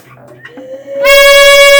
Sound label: inhale aaaaaaaaa